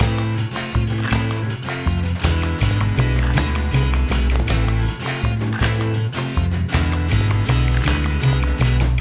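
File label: music food inferno suspense no vocals; rayman origins 2011 gamerip; food world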